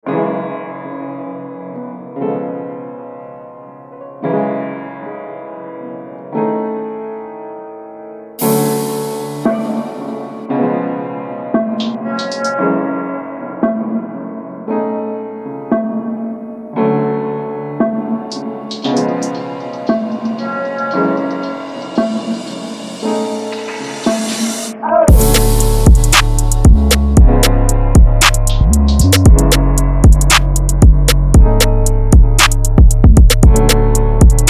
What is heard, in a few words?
Instrumental